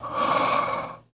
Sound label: Werewolf Breath Airy